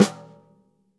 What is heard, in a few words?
snare snares on; JazzFunkKit